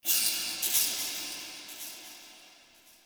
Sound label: Interior Texture High Pitch Screech, Infested, Ambience; Misc Indoor